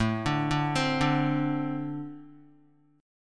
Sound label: A-Minor Double Flat 5th A Guitar; arpeggiated; JGuitar